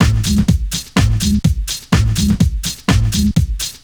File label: House 125 bpm, drums; Loops; Ableton Live 12 Lite; Core Library